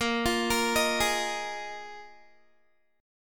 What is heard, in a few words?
Bb 7th Suspended 4th Bb Guitar 4E,4A,5D,5G,5C,6F; arpeggiated